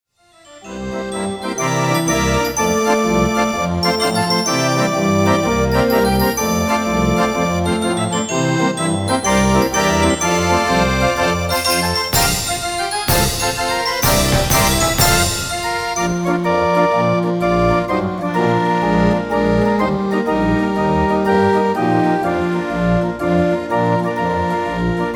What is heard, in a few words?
Band Organ; circus